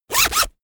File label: zipper open close